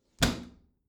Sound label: bathroom cabinet close heavy; objekte; tueren